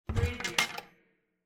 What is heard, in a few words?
cabinet door open